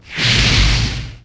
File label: fires; RYL